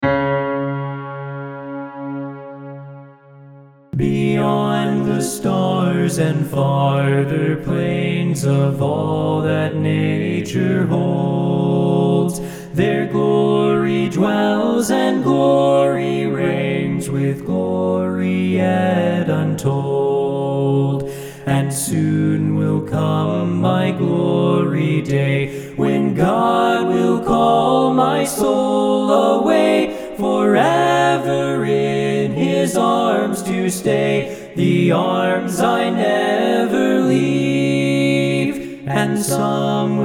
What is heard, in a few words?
LEAD